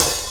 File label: dark open hat one shot g sharp key; drum single hits one shots; Open hi-hat one shot samples